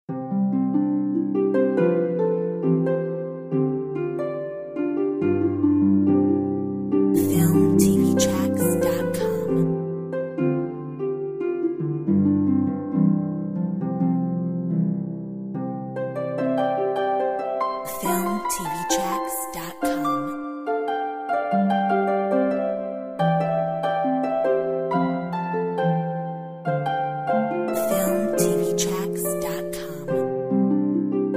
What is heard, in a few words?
Hand in Hand Harp